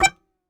Very Short Note, Melodica

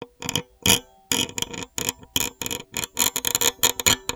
Violin Extended Technique

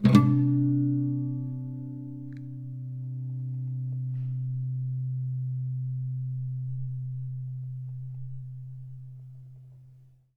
Classical Guitar Double Note Plink; Classical Guitar Extended Technique Stereo

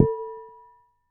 Damped Hit, Glass, Large Wine Glass

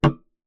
Key Noise, Melodica

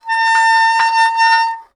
Soldering Iron Stand Drag

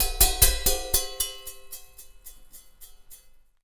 Cymbal; Percussion Samples

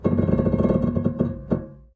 String Rub Erratic, Piano; Piano Miscellaneous

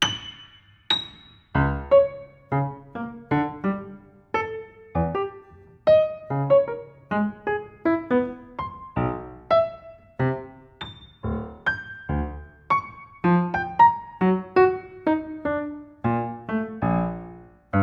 Note Brassage, Piano; Piano Miscellaneous

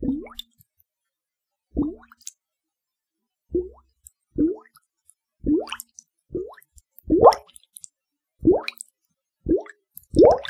Bubble Chain; Bubbles

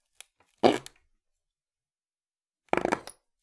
Palette, WoodenPalette

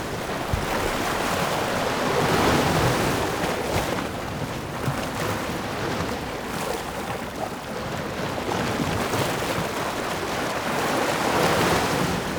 Seashore

